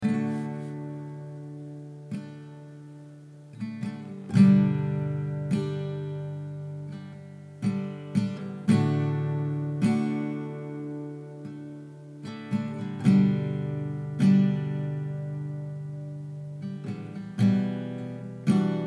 D110 GTR Impro

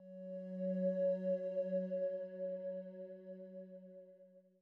Drone loop; Zenboy1955

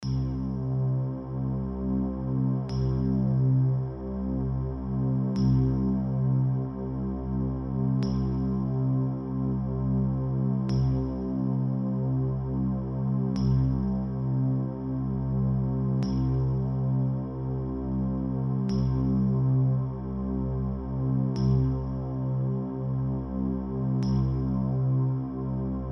Cm90 Big Bloo Groove

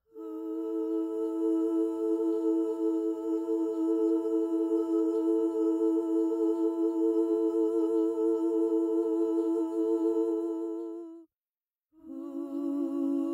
BG Vocals (Oooos); Eye of the Owl

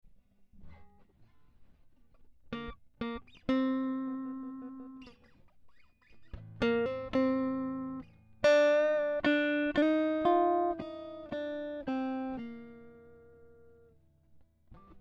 Groove

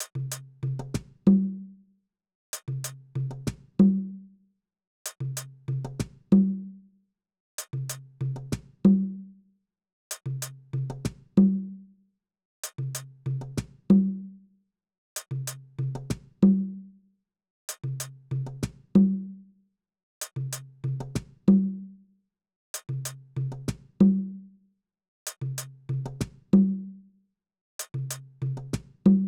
perc

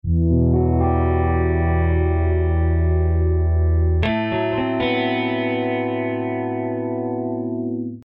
12-String arpeggios

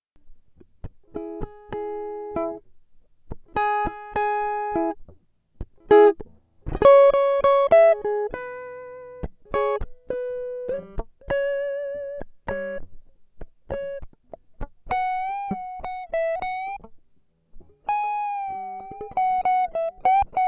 C#m100 acoustic

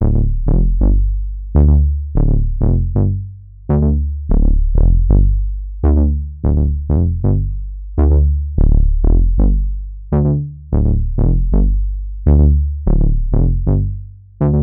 Inequality Groove

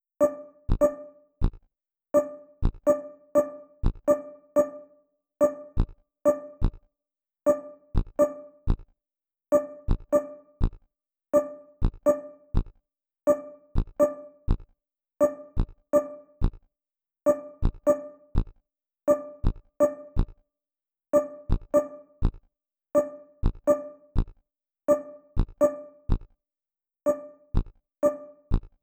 drums honk buzz

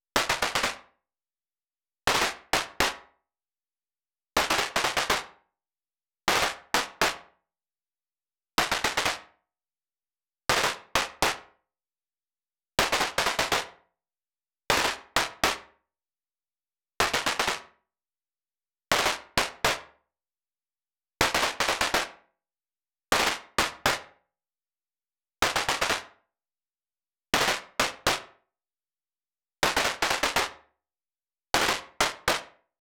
114 Fife N drum Snare

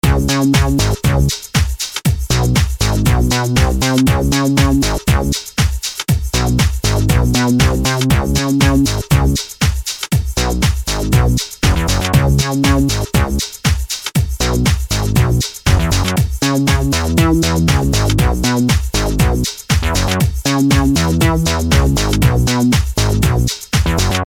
moogish bass house drum beat 119 bpm

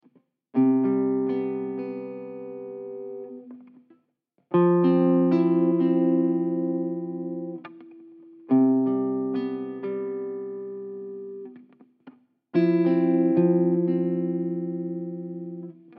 C 120 GTR impro chill groove

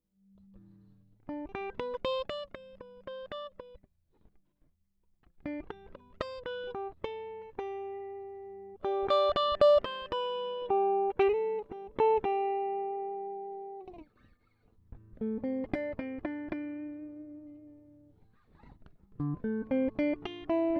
Snow riffs

